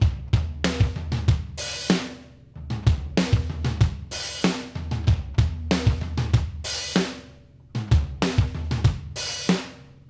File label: Analogue Drums